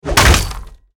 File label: waraxe hit wall